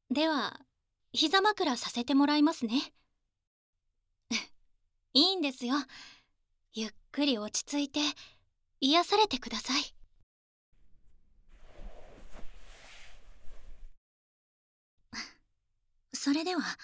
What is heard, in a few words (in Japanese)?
耳かきパート Only; 城ケ崎ミオ編